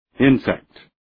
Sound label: insect